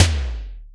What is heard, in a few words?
Electronic Tom, Drum Machines Roland R-5